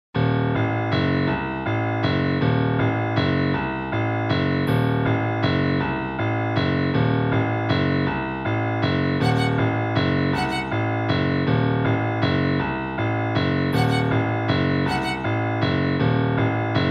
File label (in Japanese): 緊迫曲